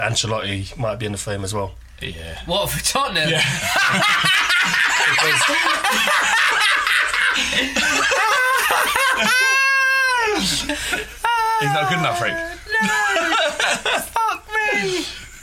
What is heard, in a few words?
laugh